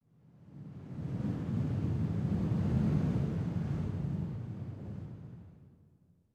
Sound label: wind buffet; ModernWarfare